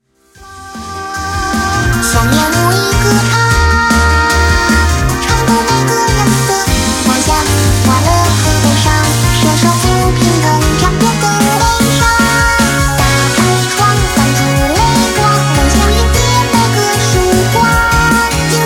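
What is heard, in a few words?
background music crossfade; Invaxion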